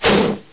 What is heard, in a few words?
jump; assault